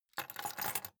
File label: key ring large place table soft